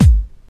kick one shot g sharp key

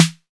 raw steel snare drum sample E key

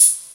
natural shaker one shot d sharp; percussion

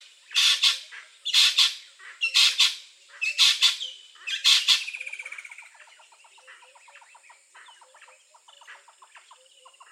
natal spurfowl - bearded woodpecker; soundscapes

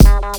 clean steel kick drum sound f key; drum single hits one shots; kick drum samples

